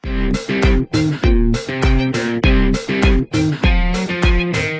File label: ringtone